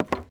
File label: plywood step; Golden Crayon Sounds